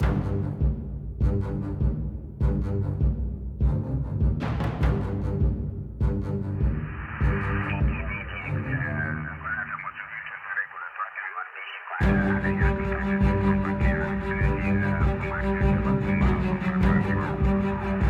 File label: Action Fight Or Flight 140bpm Mid